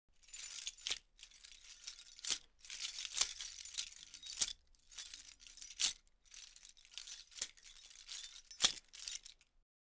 Keys Movement; Fairlight Sound Lib

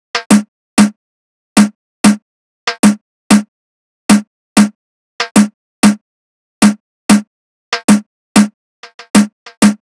Drum Loop 95 BPM Percussion; Drum Loops; Loop Stems; Uno; Hip Hop

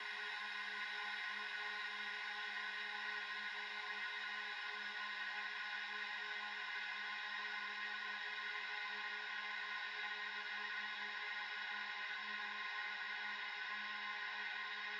128 BPM G# Min Ambience; House; Loop Stems; Cymatics; Breakdown Loops; Take Me Away

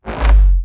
Jolt Standard

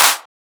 Clap, Techno; One Shots; GhostHack; Ghosthack - Free Techno Sounds 2024

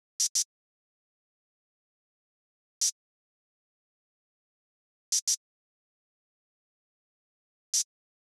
Drum Loop - 195 BPM Open Hat; Loop Stems; Cymatics; Behind Drum Loop

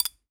Percussion, Hip Hop, Drum One Shots

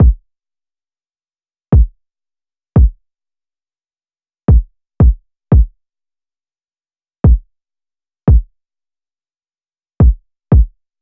Drum Loop, 87 BPM Kick; Loop Stems; Cymatics; Vibes II Drum Loop Collection; No Reason Drum Loop

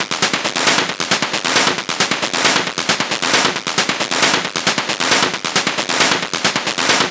Perc Loop 135, Techno

